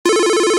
Ringer, BlackBerry; OS-4.6

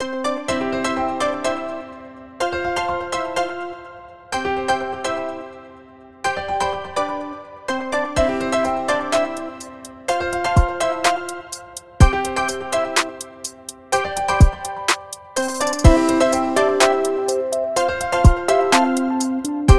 Go Beyond Electronic, alarms

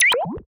Funny SHARP, keytone; Pantech; Discover P9090

